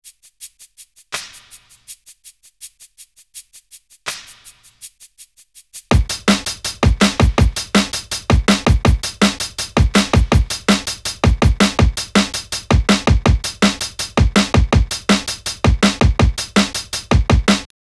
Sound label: breaks, Jungle; Zero-G Jungle Frenzy 1